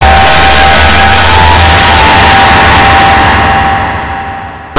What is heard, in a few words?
monster splaat yell